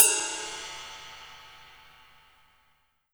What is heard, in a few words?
ride, virtual drum sounds